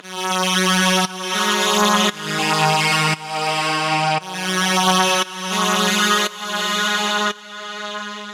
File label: 115 Emaj Phr Digi Reeds; Zero-G; Future Wave